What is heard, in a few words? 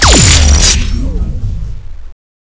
gatling; weapons